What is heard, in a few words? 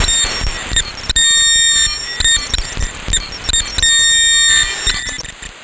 Harshy, Digital Glitch